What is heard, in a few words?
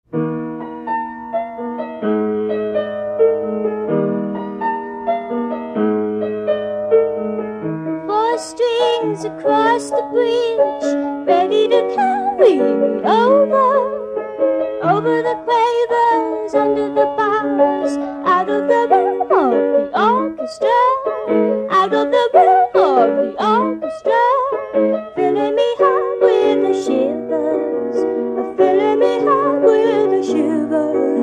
Violin